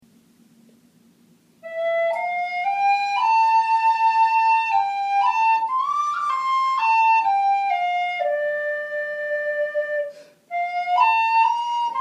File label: Danny Boy whistle